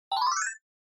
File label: Scene Change Fast Short